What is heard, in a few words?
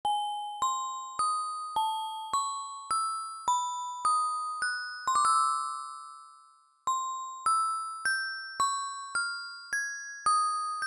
Simple Emerald, ringtones; LG Wing 5G Verizon